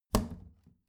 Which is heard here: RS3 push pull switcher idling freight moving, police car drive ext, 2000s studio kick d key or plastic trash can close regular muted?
plastic trash can close regular muted